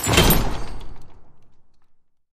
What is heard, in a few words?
wanted spin caught symbol land; Sheriff Of Nottingham